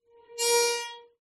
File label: OLD STUFF Iron Door Swinging